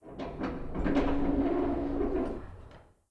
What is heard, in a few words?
Door Open; blue archive